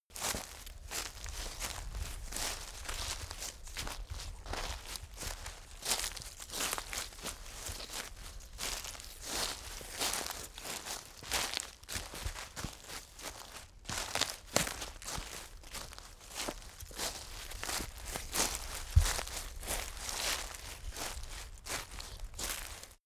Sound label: step leaves walk